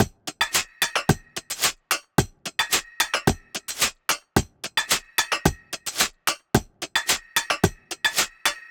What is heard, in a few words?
Foley Loop 110BPM, Drums; Antidote Advent